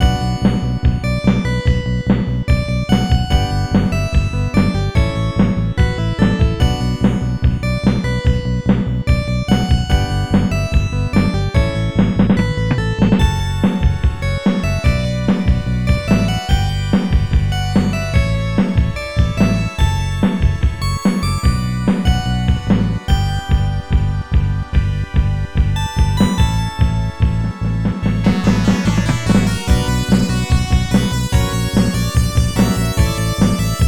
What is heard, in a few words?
All Clear; Raiden DX; arcade